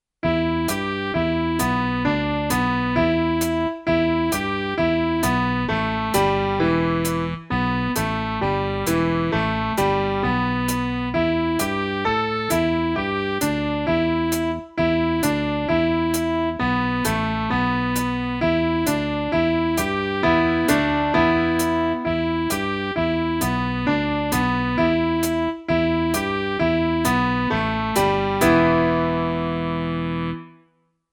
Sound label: Blues Scale